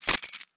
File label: key fall; through abandoned 2 the forest 2016 gamerip pc